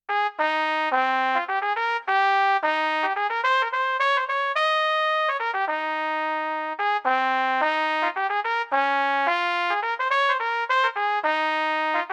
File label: Trumpet Technical